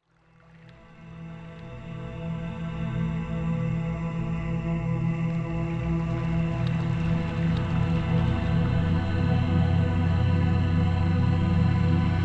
Binaural Beats Brain Waves Isochronic Tones Classical New Age Piano